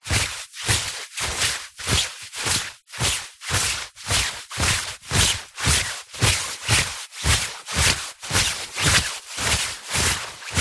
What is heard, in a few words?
foley clothes running shorts polyester walking slower movements; zapsplat